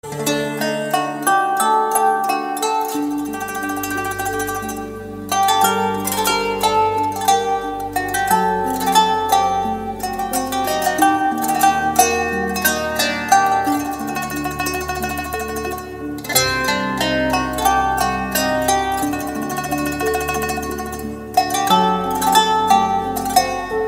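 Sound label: sirijskaya muzika, Syrian music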